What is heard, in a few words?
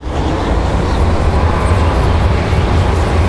whirlwind; cstrike; d2lod